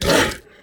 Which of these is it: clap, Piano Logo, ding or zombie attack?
zombie attack